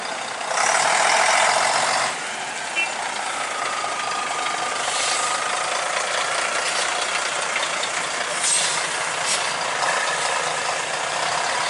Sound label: Scania; Nielson Diplomata; BR 116